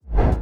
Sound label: BD Gate FX, Bassdrums; Vengeance Samples